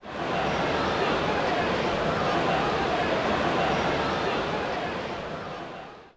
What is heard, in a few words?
HUBBUB